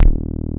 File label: SPIKE BASS; Masterbits; Dance Trax